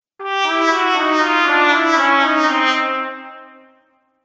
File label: Cartoon Failure Trumpet; Cartoon Music Sound Effects